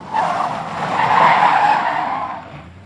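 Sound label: Cadillac Skid To Stop, Cut Off; Cadillac 1972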